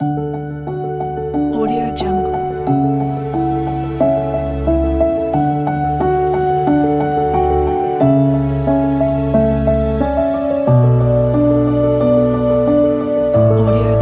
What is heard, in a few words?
beautiful piano logo